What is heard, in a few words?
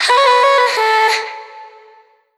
Vocal Sample Pack Phrases A; Slate Digital Omnivox Vocal Sample Pack